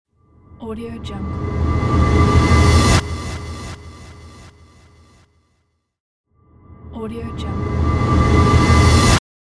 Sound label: tension cinematic reverse sound